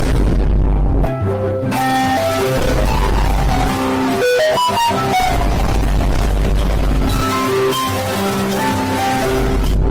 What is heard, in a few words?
alarm for sleeping